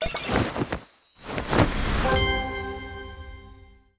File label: UI academy change major